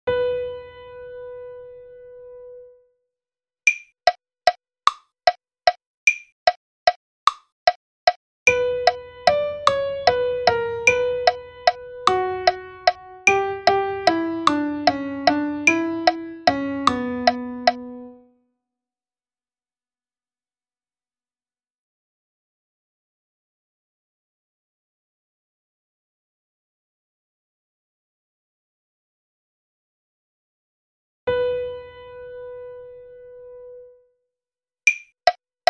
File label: Melody Dictation